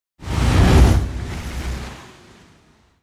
Dragon Flying Fire Movement; Hollywood Edge; sound designer's toolkit v2

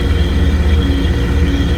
Machine Generator Loop; SFX Kit; Machinery - Generators

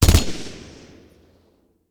Machine Gun; Sound Ideas; Guns

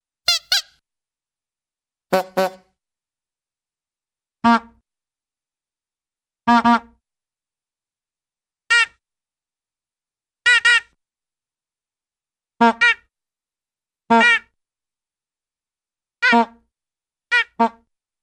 Double Honk, Cartoon; Hollywood Edge; Cartoon Trax

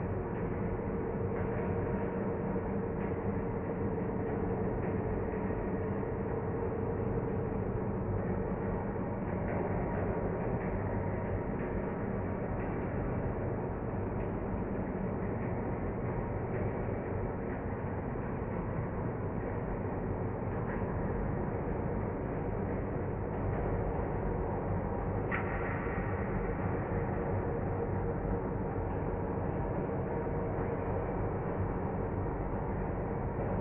industrial loop; Audio Game maker Sound Library; worldsounds

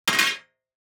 DICE on Metal, Throw and Roll, Metal, 1 One Die; Samples; ArticulatedSounds; DICE Sfx Party (Extended)

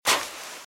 Footsteps - Sand - Dry - Walk - Left

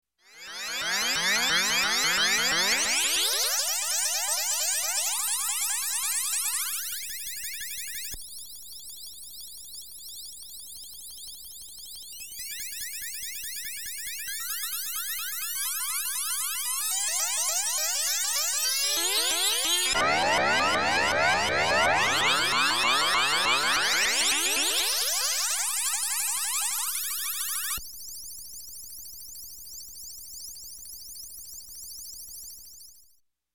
Space Warble Tone, varying, Electronic; Sound Ideas 2000 Series

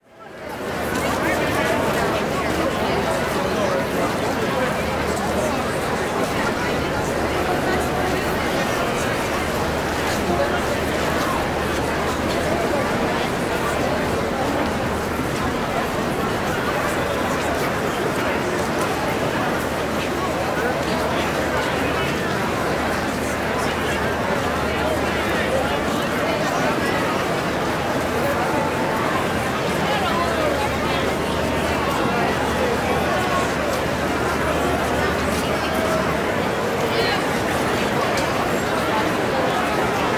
Crowd Outdoor Busy Open Plaza, Walla; Sound Ideas; Walla Series

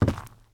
zombie footstep wood; DeadFright

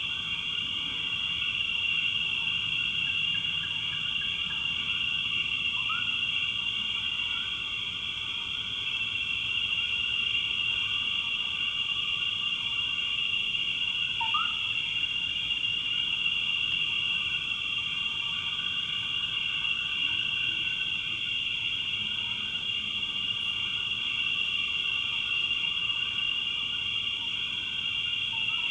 Marsh Ambience; Inquisitor 3

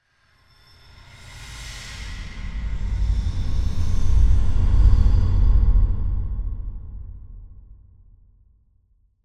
Whoosh Heavy Deep Tension Pass; Hollywood Edge; sound designer's toolkit v2

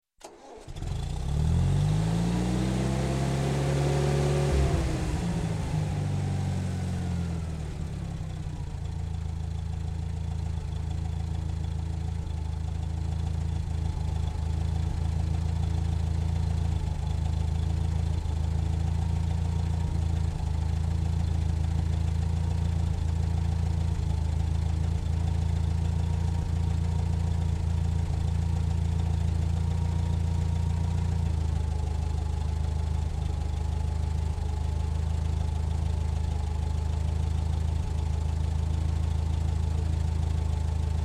Tractor, Gas Start, Idle, Stop, Industry, Farm; Sound Ideas 2000 Series

